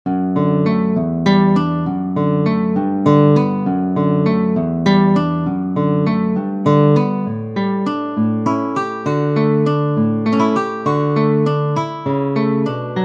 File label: Lullaby